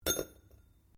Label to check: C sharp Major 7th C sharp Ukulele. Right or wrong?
Wrong